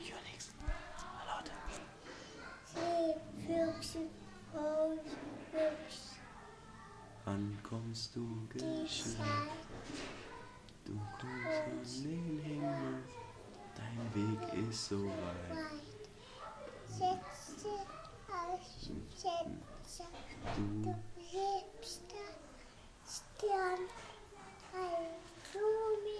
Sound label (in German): singt, 2 jahre alt